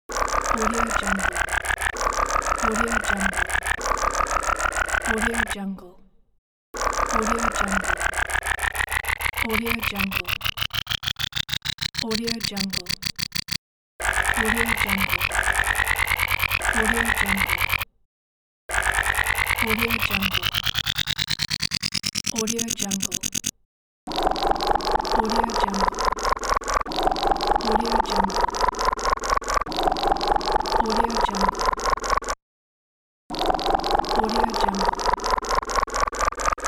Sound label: futuristic oscillator